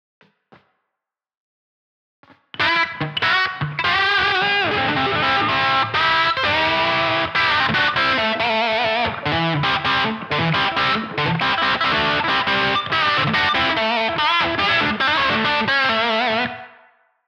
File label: Lazy Les Paul in a rock stack; admiralbob77